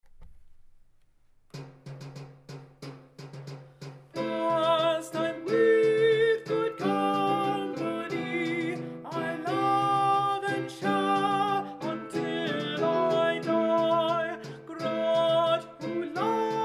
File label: Alto; Pastyme with Good Companye, King Henry VIII